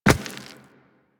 Walk Rock, Footsteps; Warframe; Operator

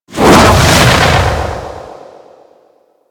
Teleport In; Mods; Deja Vu

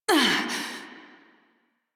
Pain, Female; Railjack Crew; New Loka

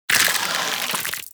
Draw A; Warframe; Weapons; Infested; Inf Cernos; Prime Derelict Cernos

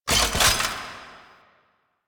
Exalted Sniper Cast Weapon; Warframe; Warframes; Frumentarius

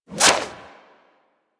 Serpent Ability Tendril Whoosh; Warframe; Warframes; Alchemist

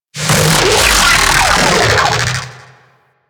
Vocal Death; Warframe; Sentients; Dolicholyst

